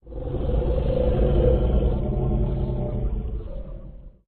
Anger At Desk; Lethal Company

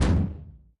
Move Ship Object Big

